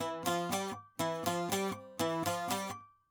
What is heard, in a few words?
saz